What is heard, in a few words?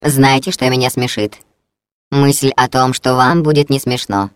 laugh; GLaDOS; taunt